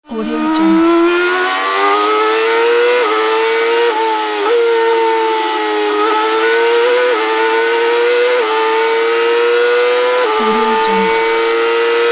formula 1 sound pack

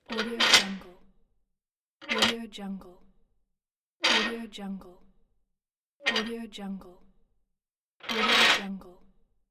old metal hinge squeak pack

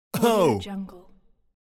male oh

